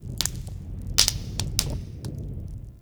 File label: Smithy Forge Fire Crackle; Soul Plane